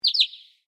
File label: Bird Honey Eater Call, Birds in Queensland; Animals; SoundEffects-Foundation1